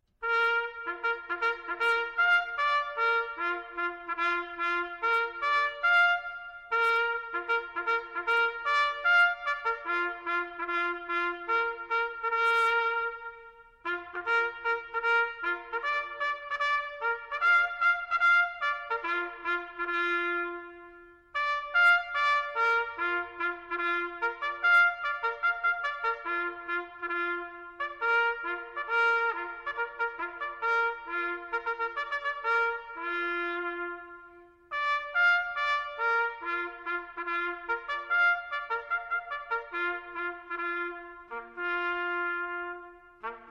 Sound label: Tattoo Low Volume; bugle; sounded at 2200 (10pm); D Troop,14th Armored Cavalry Regiment — Fulda, Germany; live bugler